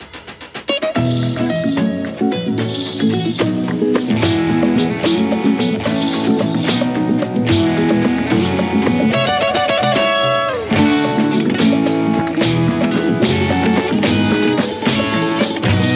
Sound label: riff